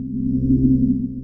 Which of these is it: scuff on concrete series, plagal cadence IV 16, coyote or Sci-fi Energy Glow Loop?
Sci-fi Energy Glow Loop